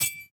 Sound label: Rapier